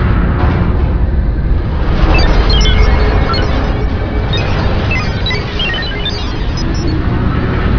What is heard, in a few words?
robots